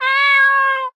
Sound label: Animal; MapleShrine; mkxp; gameasync